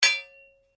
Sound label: Blade Heavy Moving Impact Ring, Sword; Foley sounds for melee weapons; Swords; Combat; SoundEffects-Foundation1